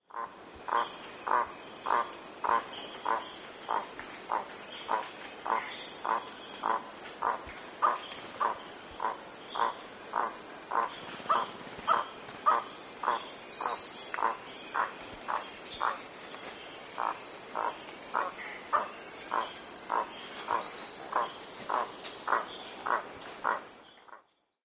crimson rumped toucanet

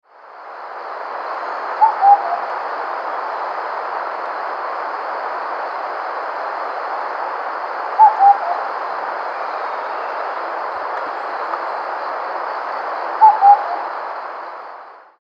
sulawesi hawk cuckoo

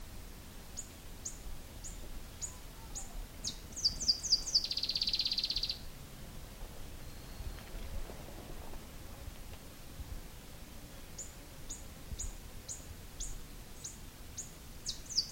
moustached brush finch

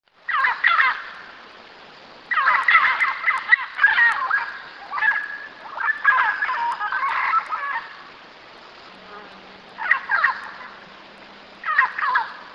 red browed parrot

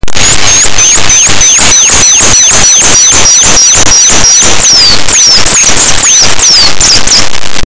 shining bronze cuckoo

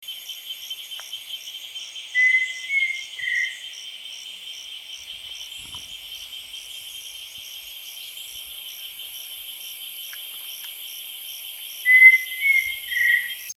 pheasant cuckoo

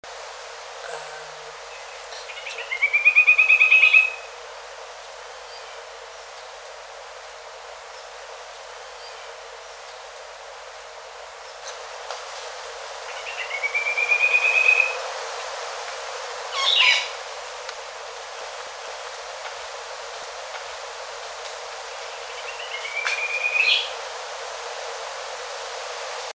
mauritius cuckooshrike

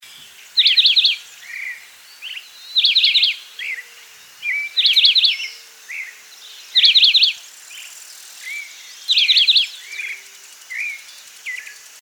many coloured chaco finch

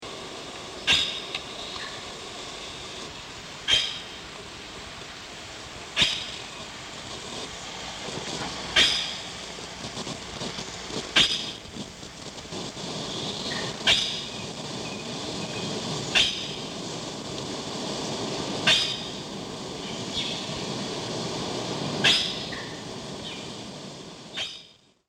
palawan peacock pheasant

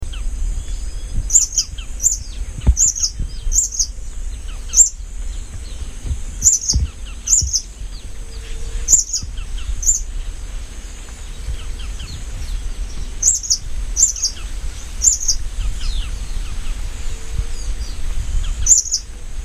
olive spotted hummingbird

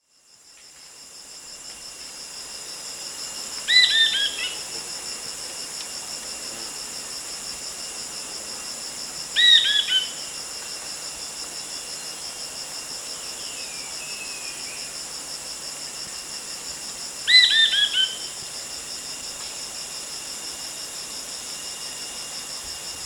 crimson winged woodpecker

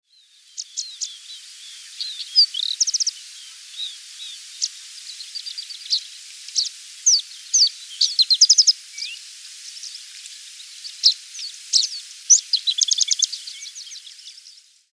australasian lark

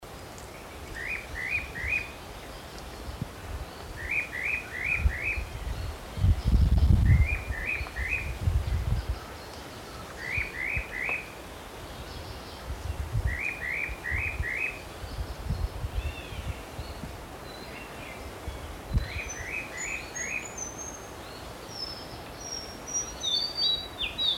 white winged robin

